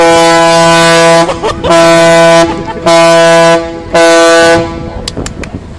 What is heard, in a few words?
Cargo Ship